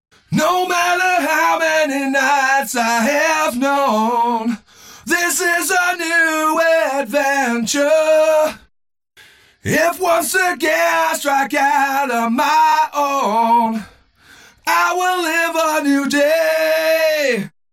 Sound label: combined solo